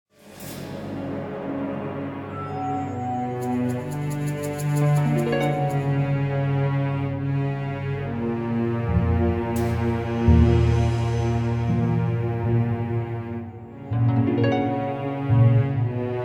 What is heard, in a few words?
Chinese Ambient